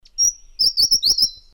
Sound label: Pygmy Wren Babbler; Pnoepyga pusilla; song; Bird Call Recordings